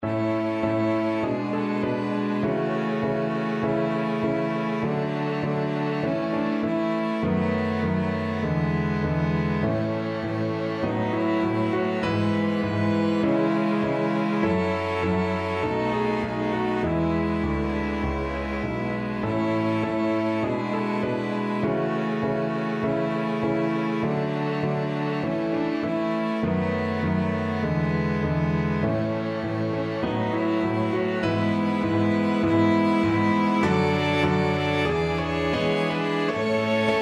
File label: piano quintet; elgar land of hope